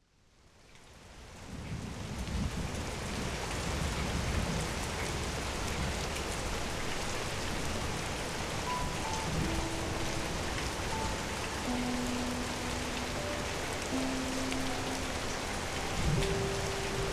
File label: Rain Sleep